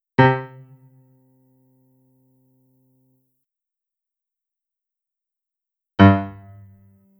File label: resonances, piano